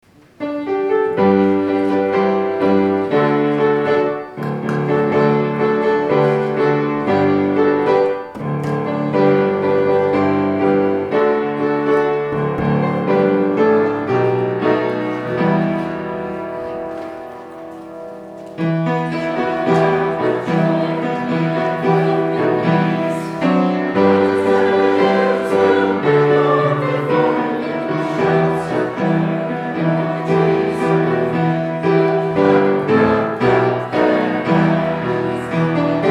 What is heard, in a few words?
Closing Hymn